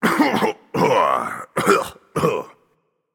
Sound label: clear throat; smite; geb